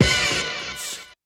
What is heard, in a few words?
hit one shot B